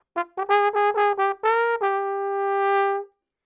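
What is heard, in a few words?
126 Latin Jazz Trombone F minor; Brass; Pro Tools Essential Loops; Digidesign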